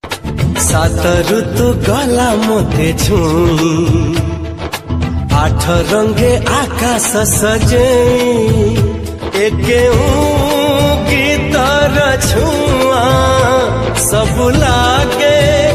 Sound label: bhajan Ringtone; Jaganatha